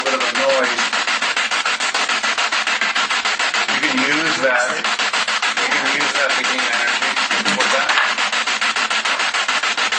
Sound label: Kitchen Spirit Box; private residence freedom road apartment investigation